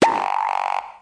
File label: alarm blop